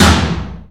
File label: TOM; Greytsounds VOL-1; SDX TOMS